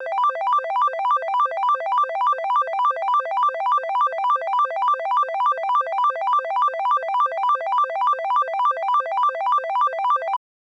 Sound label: Slot Maching Major 9